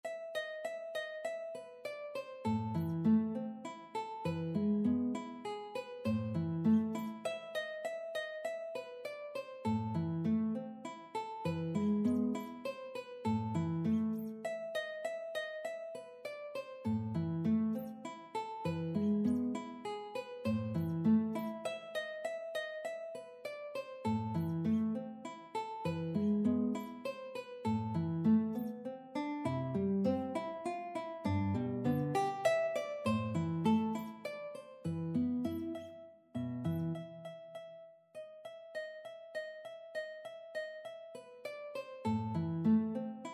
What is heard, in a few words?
mandolin guitar, mandolin-guitar duet